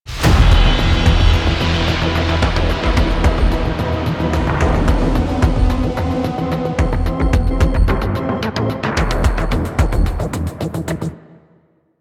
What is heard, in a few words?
Stinger; Corpus Lich Intro Cin; Warframe